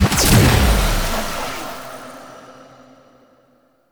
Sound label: Portal Enter; Duviri Murmur